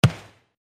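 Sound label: kicking the ball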